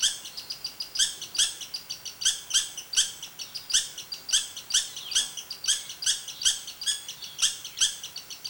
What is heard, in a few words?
squirrel call; MAMMALS